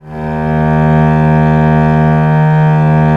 VL D#1, VIOLE, Historical Instruments; USB Soundscan vol.23

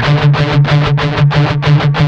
16TH, Guitars; Spectrasonics; Hans Zimmer Guitars VOL-1; MED 16TH

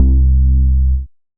80s Bass; Big Fish Audio; Old Skool House; OSH Bass

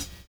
HH, drums dance & groove; USB Soundscan vol.11; AKAI

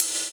Ride; Equipped Music; Loungin House

